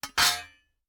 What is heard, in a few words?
Plate